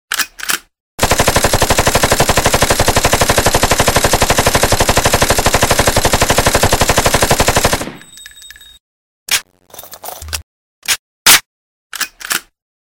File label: gun